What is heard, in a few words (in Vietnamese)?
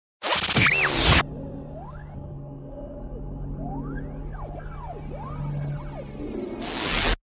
Am thanh khuech dai tin hieu radio